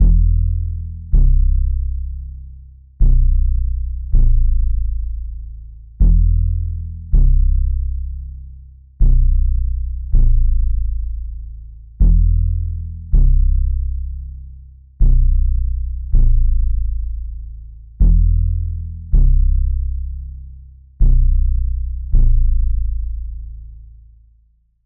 160 BPM E Min Bass, loop stems; Melody; Trap; Cymatics; 2022 Melody Collection; Trap Pt 2; Rebel

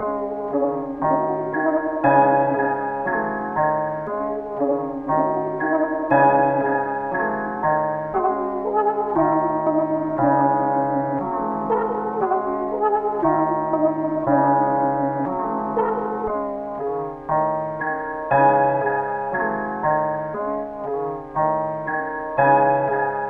118 BPM C# Min, Melody Loops - Trap; One Last Time; Cymatics; Mystery Pack Vol 4

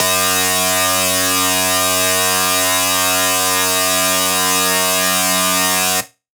Lead Shot F#, dubstep; One Shots; Powersaw; Ghosthack; Dubstep Sound Arsenal

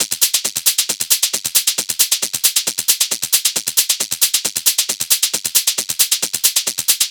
Hat Loop 135, Techno

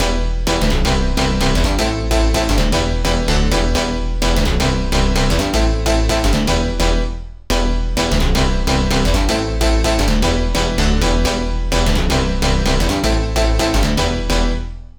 House Chord Loop 128 BPM E Min; Synths; Loops

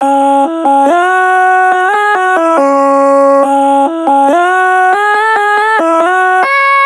Vocal Chop Loop 140 F#; Vocal Loops; Ghosthack Advent Calendar 2018 Day 9

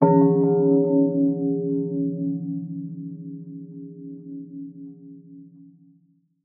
Stress

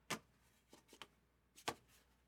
Handling Post Cards; Fairlight Sound Lib

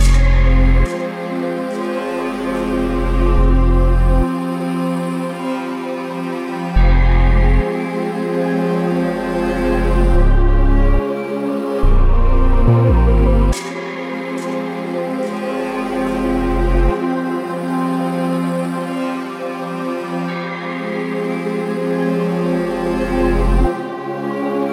142 BPM F Min (Drill), Melody; Cymatics; PARADOX II; Melody Collection

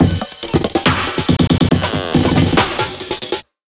Drumloop, Dubstep; GhostHack

